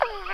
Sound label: complex call